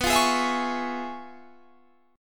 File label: Db 7th Sharp 9th B, chord shape